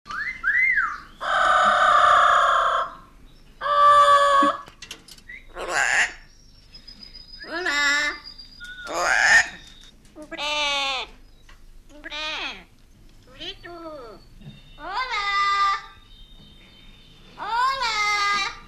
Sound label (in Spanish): loro hablando